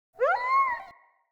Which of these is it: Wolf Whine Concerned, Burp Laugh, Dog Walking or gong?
Wolf Whine Concerned